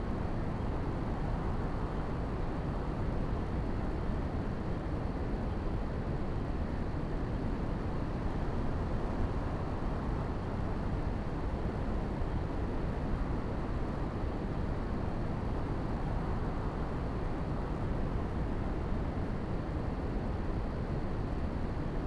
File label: night waterfall ambience; theed; Star Wars Obi-Wan; XBOX